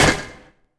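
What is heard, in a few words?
impact sword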